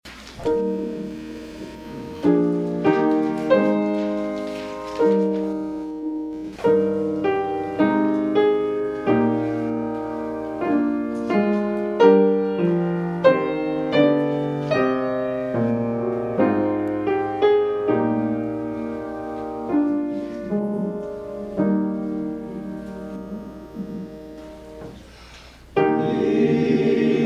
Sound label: Hymn; Lead Me, Lord